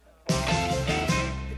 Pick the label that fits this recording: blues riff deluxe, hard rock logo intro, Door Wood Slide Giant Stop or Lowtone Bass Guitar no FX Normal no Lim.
blues riff deluxe